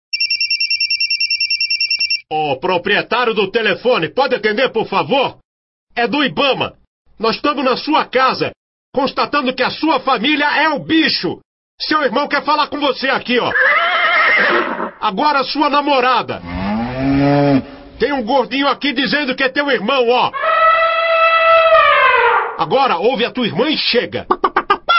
ringtone

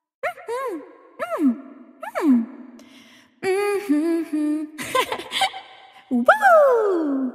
uh hum

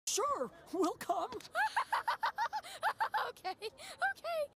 funny laugh; gumball watterson

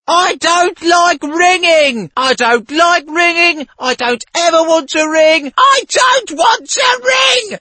funny ringtone

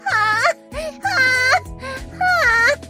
haaah haaaah haaaaah

